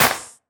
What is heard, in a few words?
Claps & Snares